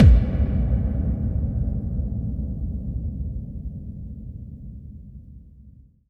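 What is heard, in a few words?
bass drum FX, dance drums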